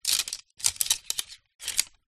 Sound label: tent pole movement; foley